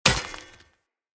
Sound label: Short Sword Throw Medium Impact Rock